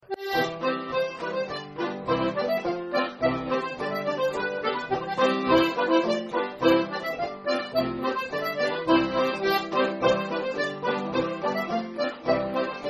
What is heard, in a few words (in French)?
Polka du Poitou